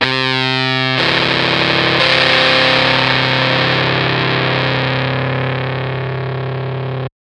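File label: Fuzztest guitar broadcast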